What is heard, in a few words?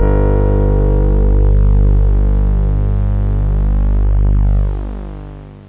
hi synth; da capo vol1; instruments